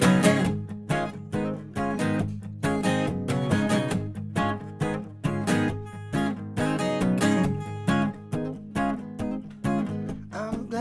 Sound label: blues